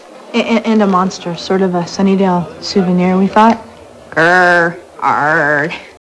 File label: grrargh